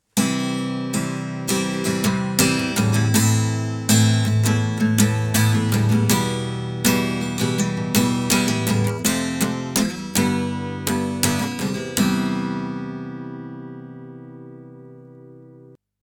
Acoustic Guitar 240Hz BOOST 240Hz ATTEN 5kHz BOOST